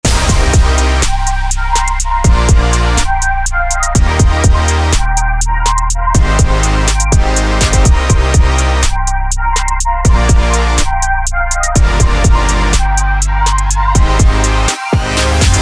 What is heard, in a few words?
Pulses